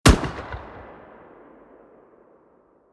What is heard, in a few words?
fire dist, 40mm; gmod; arccw uc commons attachments